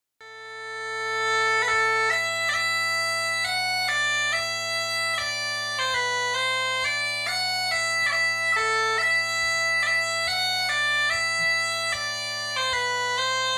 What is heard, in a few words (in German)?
Schenkenpfeyf, Dudelsack